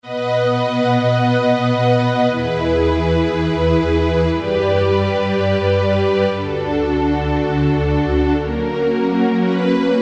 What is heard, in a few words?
Dark, Yamaha TG500